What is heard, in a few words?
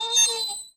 fan squeak; gesource